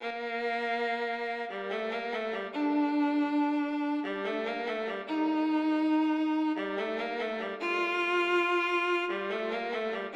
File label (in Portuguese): Violino Solo